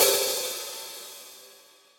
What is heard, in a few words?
HIHAT open